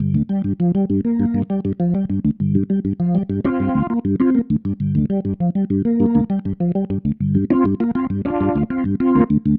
Pattern, Organ

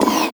Sweep Hit; Sonic Foundry (Sony Creative Software); Esoterik Beatz; Sweep Kit

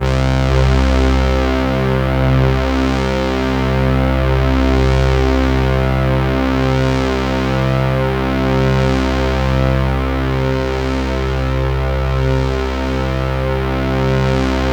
C2, Synth Line; 90 s Sample CDs; NiceBeats; SynthLine VOL-1

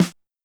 SQTM, Sequential Tom

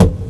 TOM, TOMS KIT, Ragga & Dub Reggae

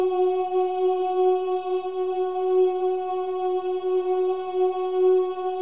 CHOIR OOS, F#4; Spectrasonics; Symphony of Voices

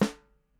Dyno Snare; Airwindows

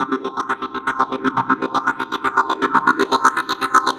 Seq Gate fx, Drum & Bass; Sonic Foundry (Sony Creative Software); Knutrix Drum & Bass

